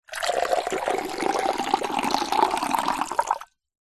drink water pour into tumbler drinking glass from slight height